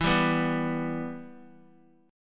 E Minor E down Guitar; strummed; JGuitar

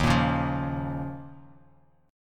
D sharp 7th Suspended 2nd, Bass Standard; strummed; JGuitar

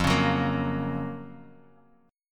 Gb Major F; Gb/F strummed; JGuitar

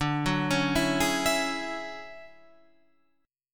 D Major Sharp 11th D Guitar Half Step Down, chord

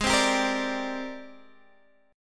G sharp Major Sharp 11th, Guitar Drop D; x,11,10,12,11,10

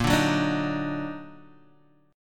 D sharp Minor Major 7th A sharp

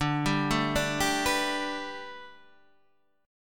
A 9th Suspended 4th, D

